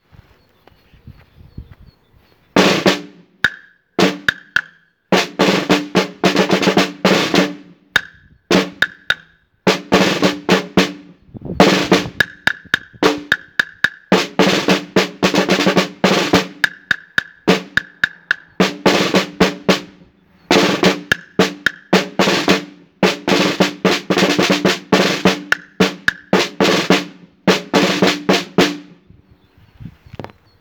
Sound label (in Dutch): live trommelmars TROMMEL